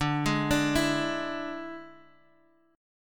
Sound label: D Suspended 2nd Flat 5th D Guitar Open D